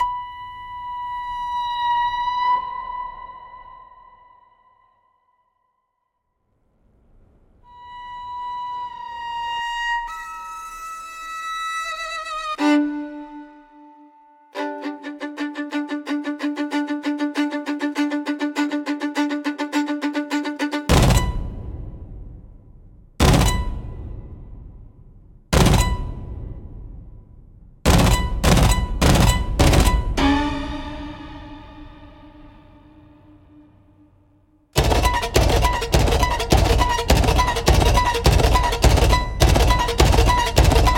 Jump Shock Sequence, aggressive panic for direct fear and jump